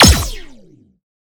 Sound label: Sciweap Shot Medium Laser Assault Rifle; Robobeat